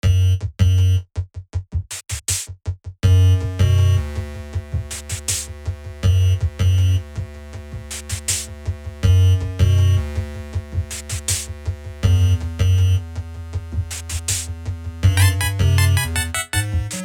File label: Area Bats